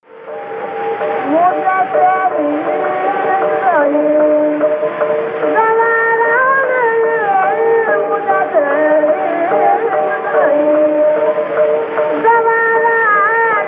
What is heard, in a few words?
tilak kamod